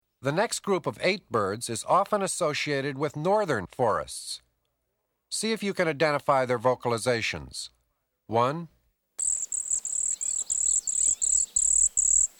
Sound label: Northern Forests; N. America; Eastern and Central North America; Birding by Ear; Peterson Guides